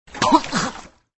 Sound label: Drown